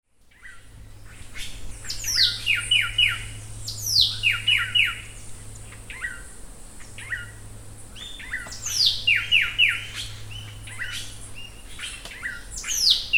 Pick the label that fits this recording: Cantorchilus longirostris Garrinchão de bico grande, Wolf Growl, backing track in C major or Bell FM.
Cantorchilus longirostris Garrinchão de bico grande